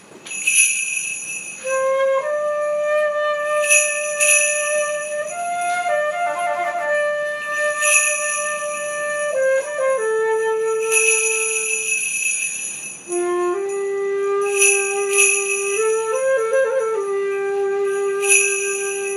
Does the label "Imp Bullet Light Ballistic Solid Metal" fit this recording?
No